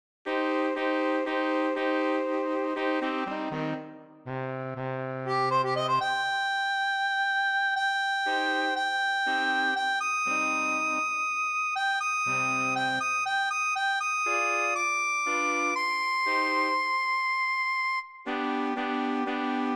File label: MIDI